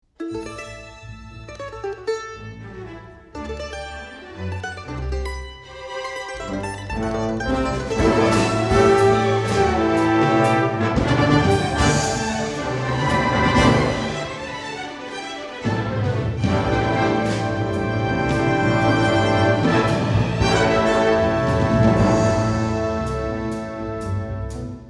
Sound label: mando